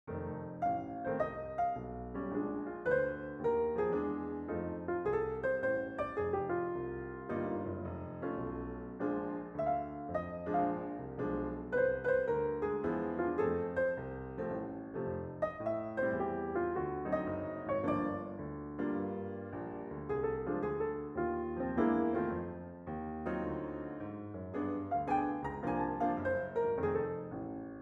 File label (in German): Improvisation; Hörbeispiel 6 - Improvisation (Klavier)